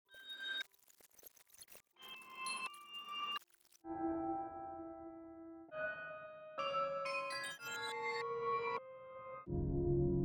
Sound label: piano pitchhack; Ableton Live; Creative Extensions